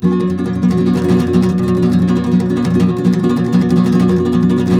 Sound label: C M, Guitars; Spectrasonics; Hans Zimmer Guitars VOL-2; FLAM TREM CH